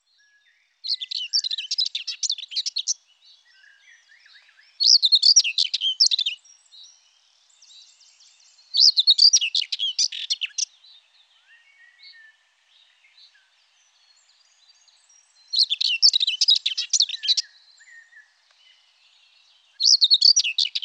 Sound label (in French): Fauvette grisette